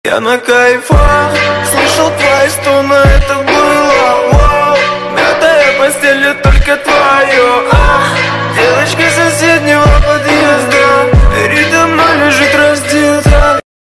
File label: groove stone